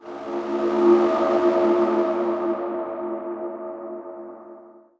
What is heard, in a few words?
echo layer; weapons; dota; earthshaker